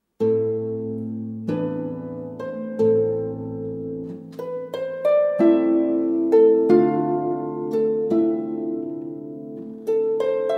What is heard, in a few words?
Sonate Arpeggione, harfenisten